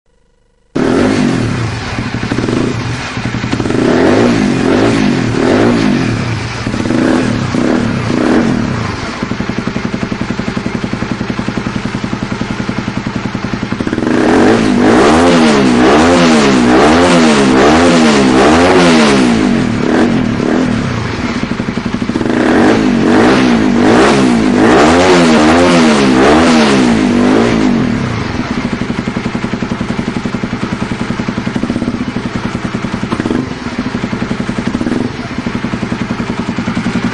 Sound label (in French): echappement; REMUS; Type : JC34